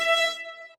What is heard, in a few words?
ORC E6